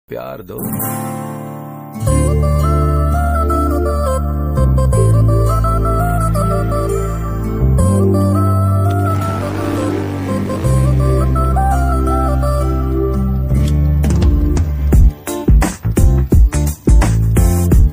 love bgm; mirchi; khatarnak khiladi movie

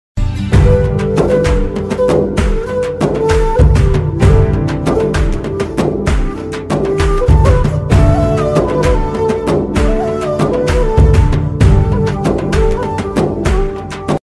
movie love bgm ringtone; Kotha Bangaru Lokam

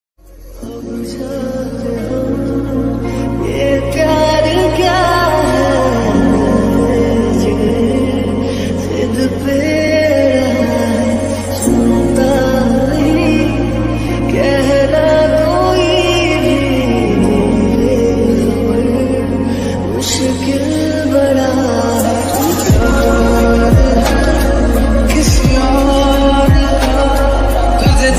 calling tone

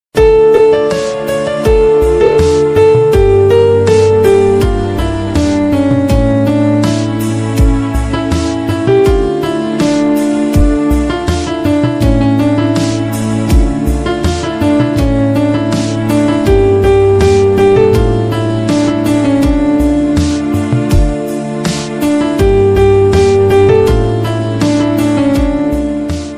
tamil love bgm ringtone love bgm; saravana

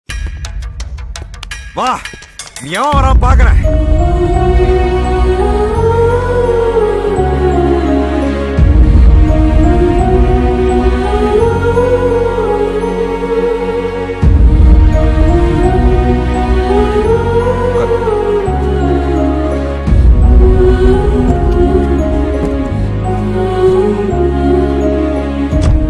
tamil movie romantic bgm; paiyaa; karthi; tamannaah

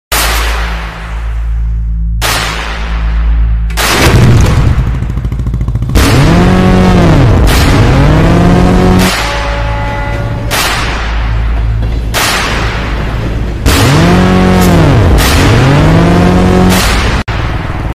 bullet bike bgm; arjun reddy

